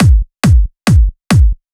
Kick 138BPM, Drums & Perc; G#minor; Kick 4 4; Vengeance Samples; Manhattan Kit

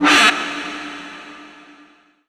FX Scratching; Vengeance Samples

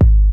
Kick, Trap

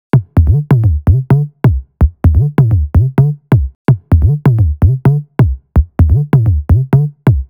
128BPM Tribal Kiss Kit

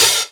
Open Hihat; Vengeance Samples; VEE Cymbals

